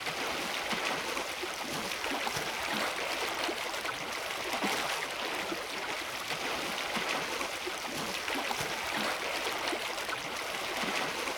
ship engines; World Of Warships

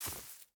footstep hide grass run; Rust

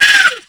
dragon hurt

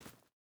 footstep barefoot forest jump start; Rust

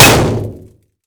Projectile Sounds; Red Orchestra Ostfront 41-45

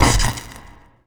discharge

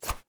knife bayonet swing; Escape from Tarkov

